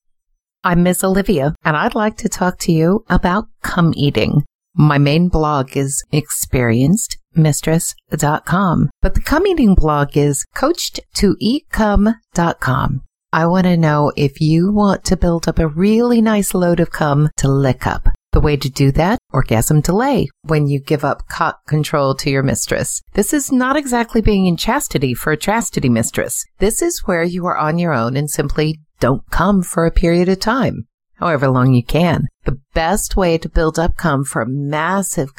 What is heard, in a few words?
Orgasm; Delay; Cum Eating